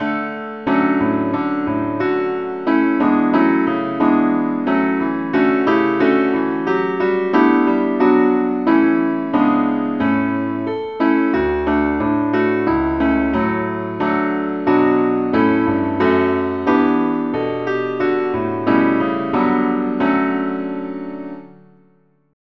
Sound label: keyboard only, key D; saintthomas